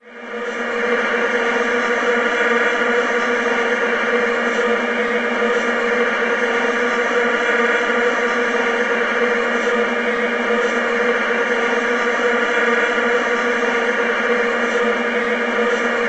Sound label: Vuvuzela